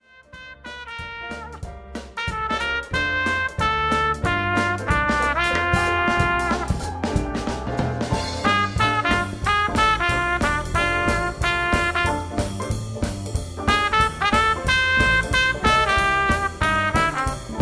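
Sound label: dixie easy trumpet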